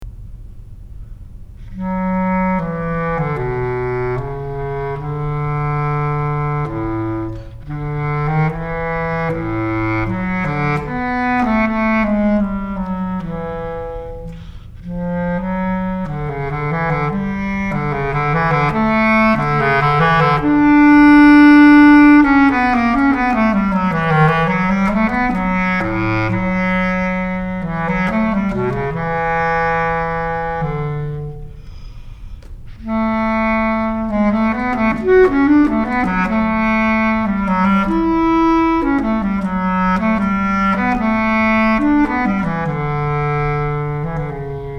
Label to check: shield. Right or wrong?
Wrong